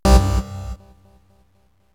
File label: Error; GameCube System Sounds; GC